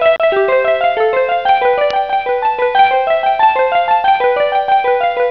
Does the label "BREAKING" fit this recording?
No